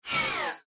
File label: Sci Fi Robotic; Robots